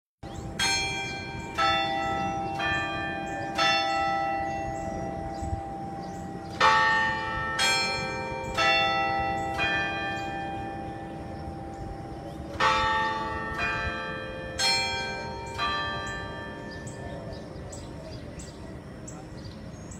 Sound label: tower bells